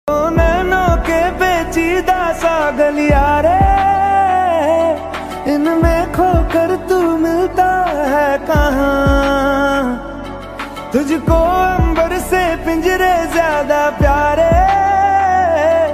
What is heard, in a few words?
slowed reverb lofi remix ringtone love ringtone new ringtone